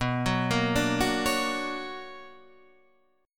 B Minor Major 9th B Guitar Standard, chord; BmM9 arpeggiated; JGuitar

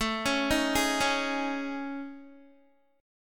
A Major 7th Flat 5th, Guitar, chord; AM7b5 arpeggiated; JGuitar

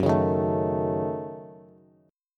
Gb Minor 6th, Bass; strummed; JGuitar

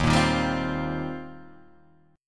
Eb 6th Add 9th, Guitar, Open D

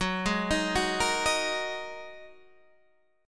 G sharp 7th Suspended 2nd F sharp

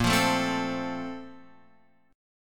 A sharp Major 7th Suspended 2nd; A#M7sus2 Chord {6 8 7 5 X 5} strummed

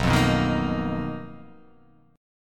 B Major 7th Flat 5th B down, chord shape

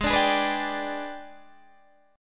Gsharp Minor Major 7th Flat 5th, Guitar, Open D; 18,17,18,17,17,17

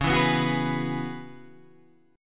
C sharp Minor 11th C sharp, Guitar Open G

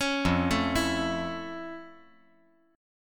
F Augmented Major 7th F

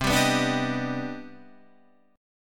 C Minor Major 9th C

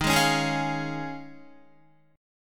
Eb 7th Suspended 2nd Suspended 4th Eb, Guitar; Eb7sus2sus4; strummed; 10,11,13,13,13,13; JGuitar

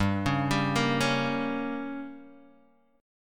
G Diminished, Guitar, Standard; arpeggiated; JGuitar

